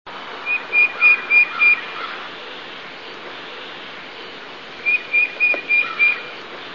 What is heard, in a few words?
titmouse, tufted titmouse; birdsongs